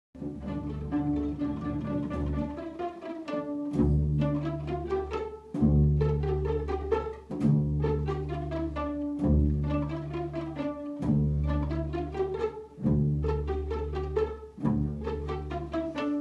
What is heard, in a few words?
String